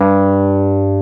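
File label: Wurlitzer G2, Keyboards Old School; USB Soundscan vol.09 - Keyboards Old School; AKAI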